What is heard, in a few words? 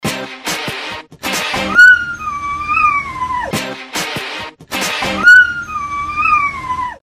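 ringtone dog howls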